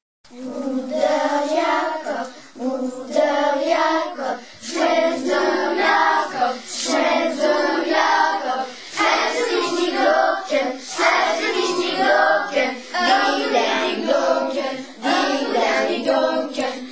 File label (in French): DING DONG Ecole publique